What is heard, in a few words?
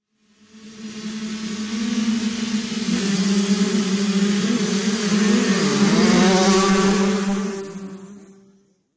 Motorcycle Motocross; By 125 Cc ( Several ), Cu Fast Bys L / R, Shot Cu.